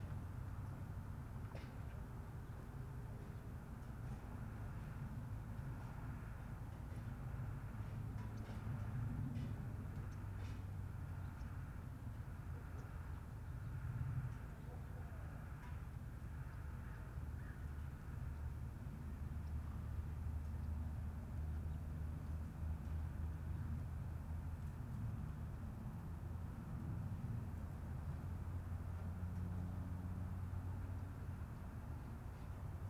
town generic

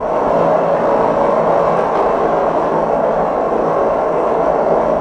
reverb

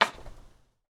jump

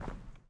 step concrete

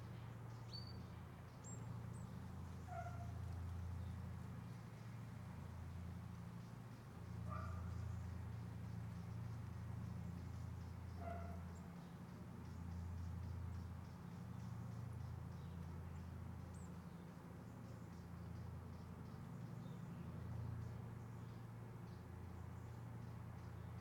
garden generic

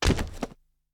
hit

